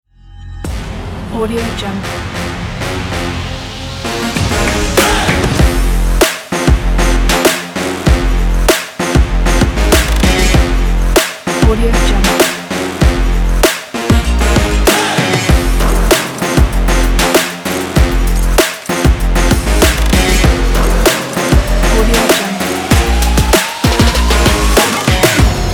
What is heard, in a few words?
action power trap